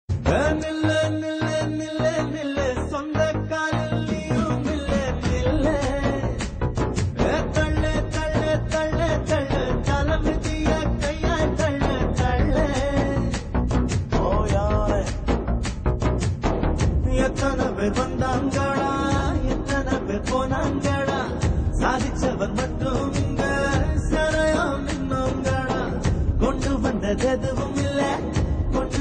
Thuppakki Ringtone

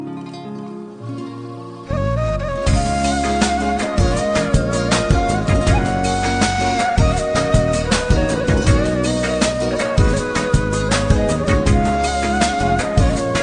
love music

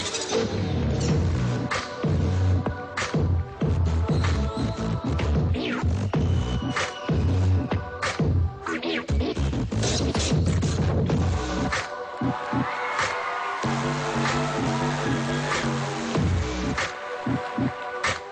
Hip Hop Bass Drummer

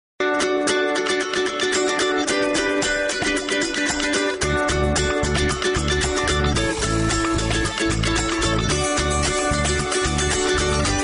Iphone4 Crown Jewel

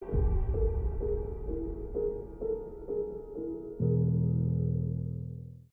closing

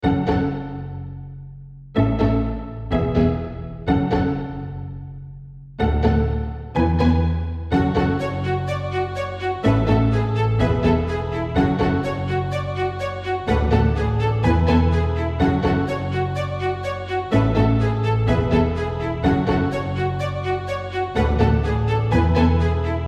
Strings

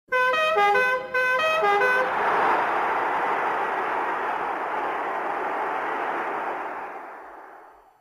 Goal Horn

